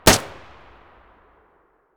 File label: sa80 muzzle mono distant, weapons